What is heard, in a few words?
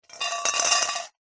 candy boiled sweets unwrapped pour into metal scales bowl; food; zapsplat